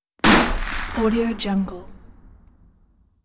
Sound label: rifle shot long